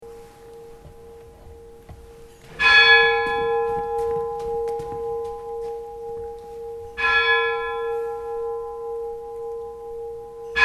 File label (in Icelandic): reykholakirkja likhringing, kirkjuklukkur